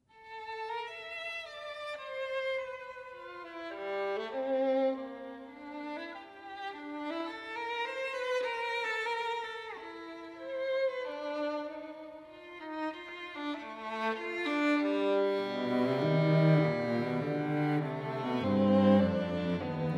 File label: String Quartet